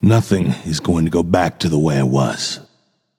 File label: death; smite; apollo